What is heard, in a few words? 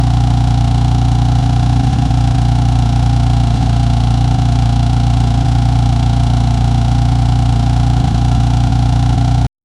ford mustang car engine s